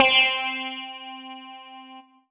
Roland GR 1 Sitar C5

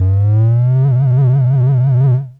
Yamaha PSS-280 UFO

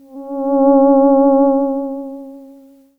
Yamaha DX7 Voices C4

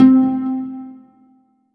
Roland JV 2080 Harp C4

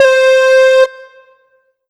Square Wave C5; Korg NS5R

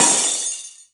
Korg N1R Breaking Glass

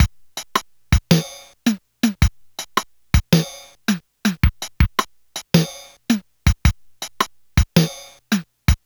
Casio MT-600 Reggae

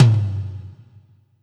Kawai K5000W Melo Tom

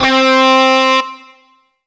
Alesis S4 Plus Charang C4

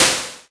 Korg DW 8000 Noise Snare

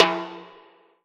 Yamaha TG100 High Timbale

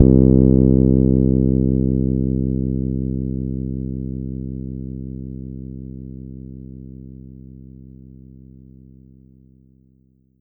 Korg N1R Stein Bass C2

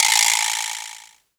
Roland R-8 Vibraslap

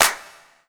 Korg TR Rack Standard Kit Clap

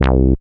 Roland JX-03 Funk C2

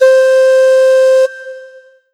Yamaha EX5 Bamboo C5

